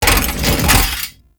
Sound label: Bodyfall